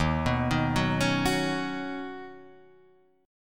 D sharp Minor 7th Sharp 5th, Guitar, Half Step Down